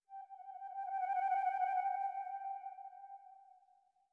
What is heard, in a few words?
flute trill; Sky Children of the Light gamerip